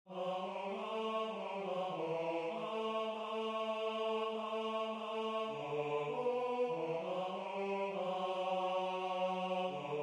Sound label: Tenor